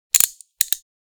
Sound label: Prisoner handcuffs latch closed